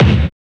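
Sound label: CYBER KICK; Drums In Your FACE!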